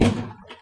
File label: kanisteri, Clangy Crunchy Foundsound Kit